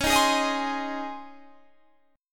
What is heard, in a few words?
C sharp Major 7th Suspended 2nd C sharp, Guitar Standard; strummed; chord; 21,18,18,20,21,20